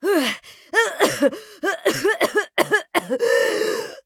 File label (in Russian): кашель; Сомбра